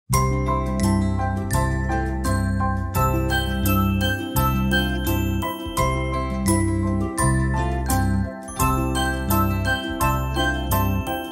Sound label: Cute Background Music